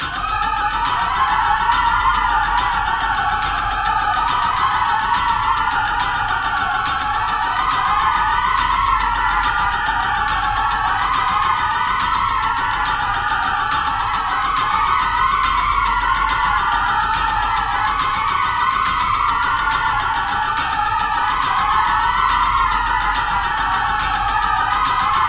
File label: witchroast